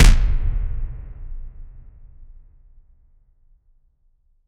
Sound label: punch sound, boxing